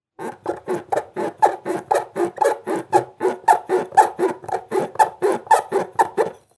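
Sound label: clean dishes sound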